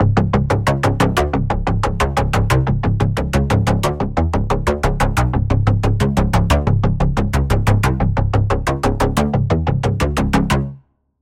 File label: Pulse, Spire; Target Realm; Routed Sound; Spire Expansion; Quantum Enforcer Vol.1